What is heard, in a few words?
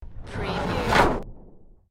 Skiing, Snowboarding, Snowboard jump, snowboarder off jump